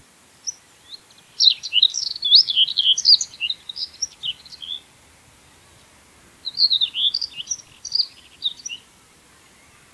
whitethroat song; Whitethroat (Cierniówka)

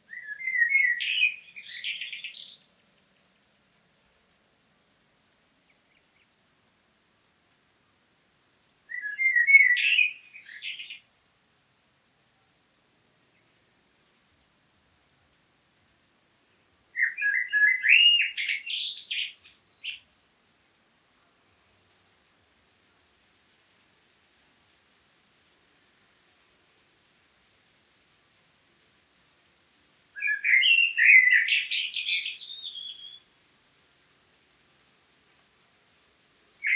blackbird song; Often heard at dawn & dusk

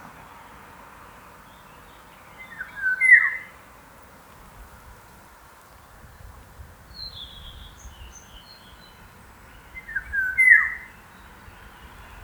golden oriole song; Golden oriole (Wilga)

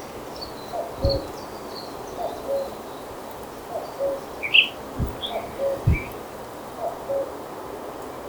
cuckoo song; Cuckoo (Kukułka)

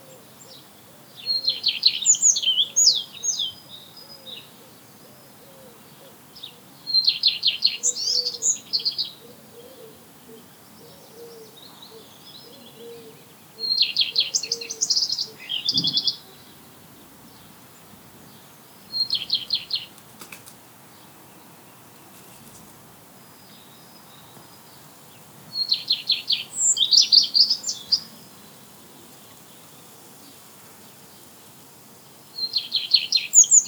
redstart song, Very beautiful and varied song.; Redstart (Pleszka)

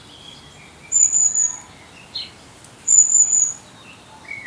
long tailed tit call; Long-tailed tit (Raniuszek)

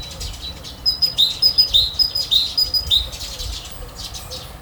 great tit song; great tits have three distinct songs

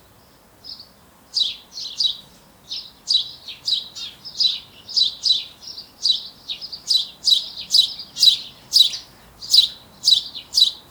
house sparrow call

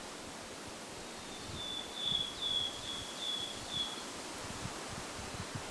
willow tit song; Willow tit (Czarnogłówka)

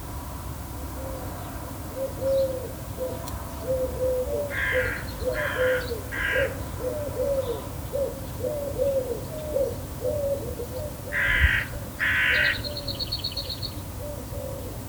dove song; Dove (Sierpówka)

